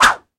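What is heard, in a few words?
attack swish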